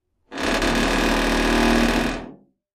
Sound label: door creak med, squeaks